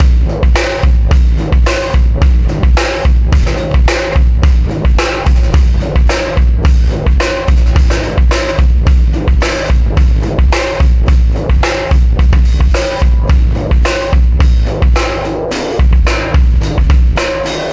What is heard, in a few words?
System Overload Beat, Processed Drumkits